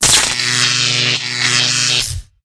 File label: Pigs Electrocuted; Angry Birds